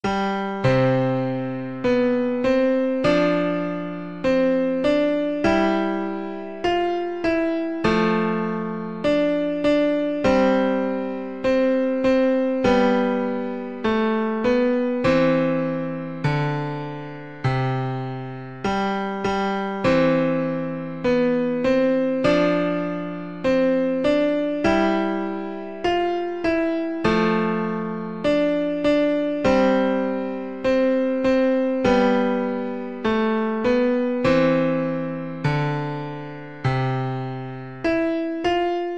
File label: piano